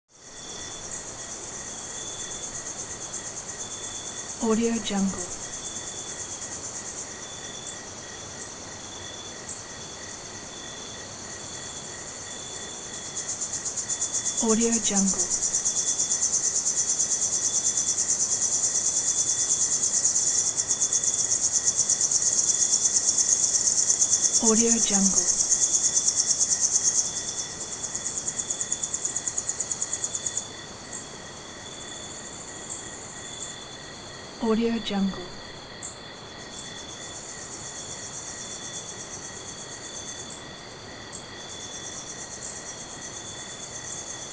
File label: jungle night atmosphere with busy crickets